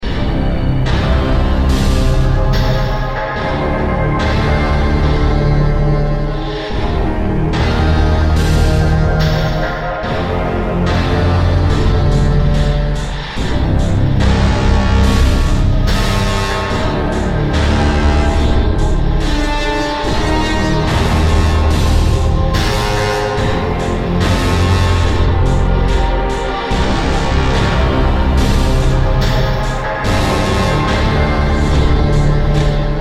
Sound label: Countdown; Insanely Twisted Shadow Planet